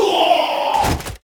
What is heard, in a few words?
Magician death; AquilasTemple